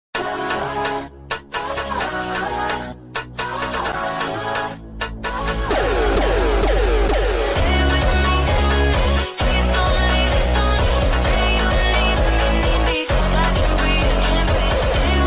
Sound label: heavenly jumpstyle